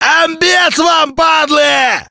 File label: attack; metrocraft; customnpcs